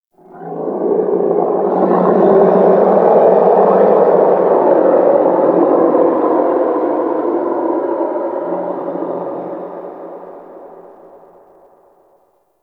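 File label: Distant Moan One Off, Shipyards; Warframe; Grineer Shipyards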